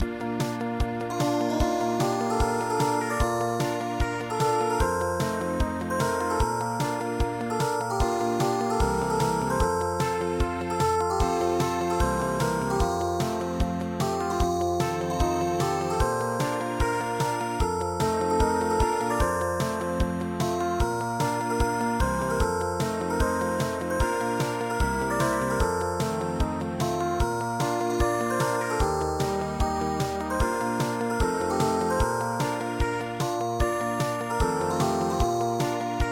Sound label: ballade; Das Lied von Brabant